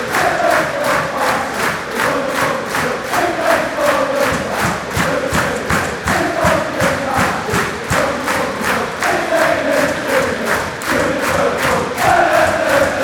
fan chants, england

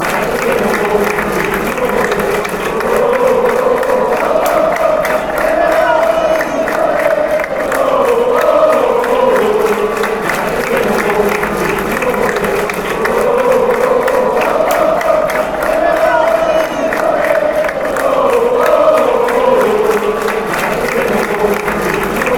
heart fanchants

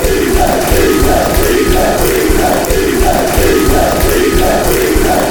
fan chants; bfc

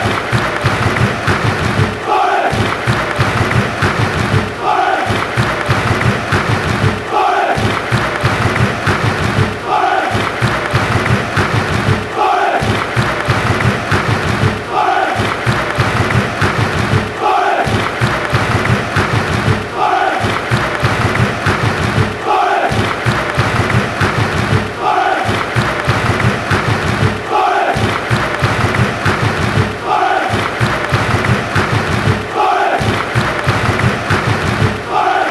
claps, fanchants

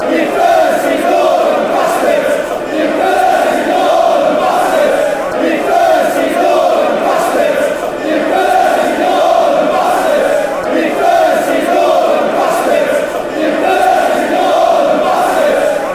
you dirty northern bstards chant; stfc

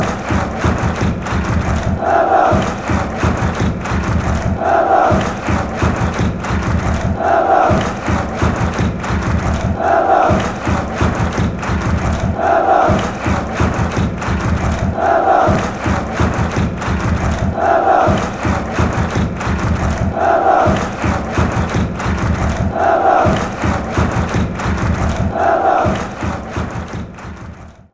drums, fanchants; hertha

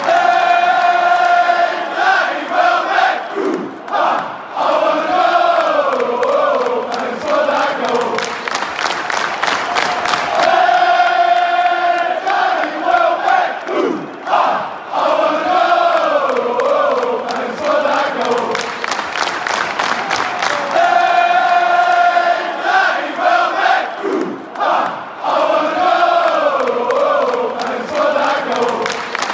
fanchants, football songs; danny welbeck; brighton

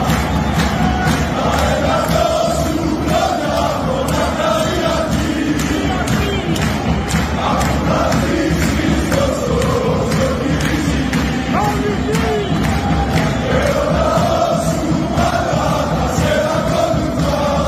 aek sunthema 100 khronia, fanchants

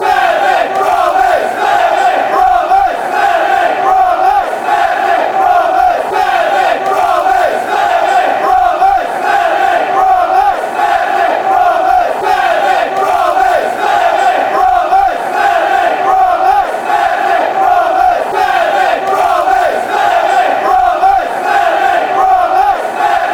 chants; smethwick brummies

